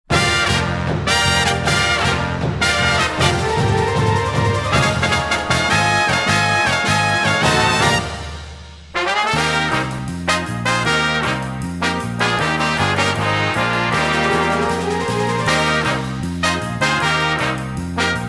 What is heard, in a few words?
Theme Instru; The Flintstones; HannaBarbera Cartoon Series